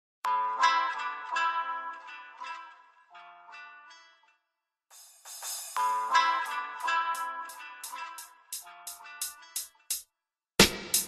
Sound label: rap instrumental hip hop beat